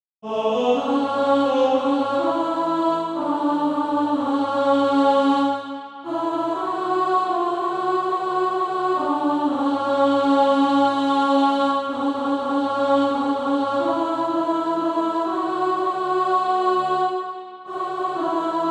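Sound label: alto; SATB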